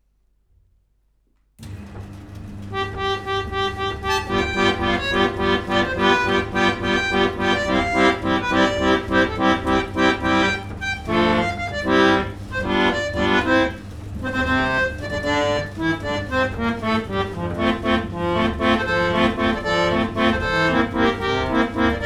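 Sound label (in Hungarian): harmonika jatek aeolian 65 hangu harmonikazo baba, verkli; franciaorszag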